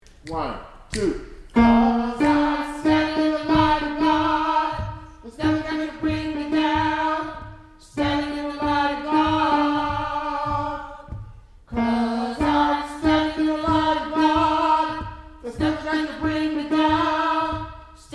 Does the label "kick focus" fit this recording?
No